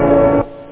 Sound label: vocal; da capo vol1; effects rave